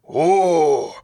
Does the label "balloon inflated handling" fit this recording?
No